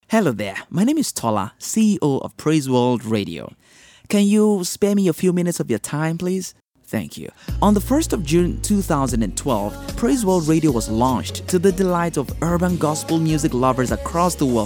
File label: Fundraising Campaign Jingle; Praiseworld1000